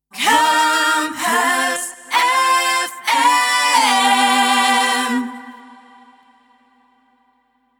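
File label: Sung Jingles C major 128bpm Acapella; Compass FM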